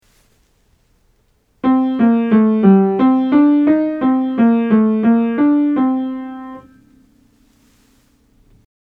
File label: Modal Scales Aural Training exercise audio